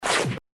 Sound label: Slip